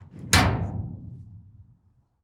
Opening Garage Door Handle

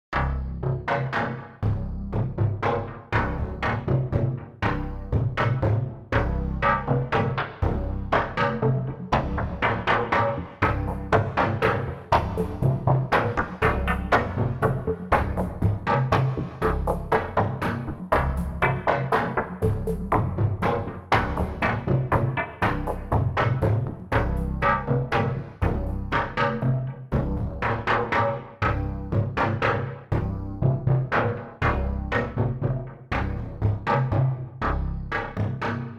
Strange Beat

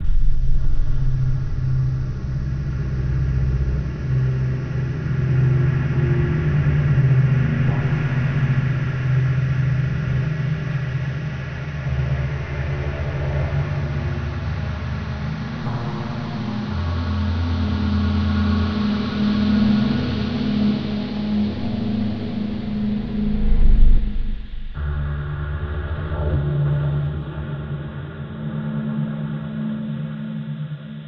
Traveling Into A Black Hole

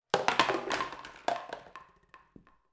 Multiple Falling Bottles

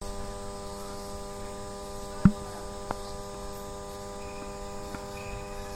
Electrical Current Outdoor Electric Box

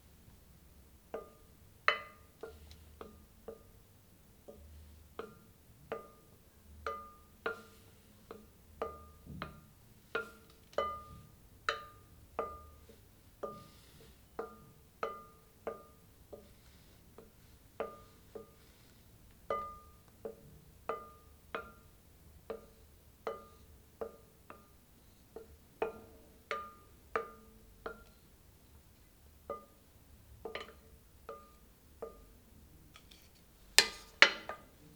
Stirring Bowl

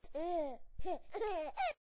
Baby giggle

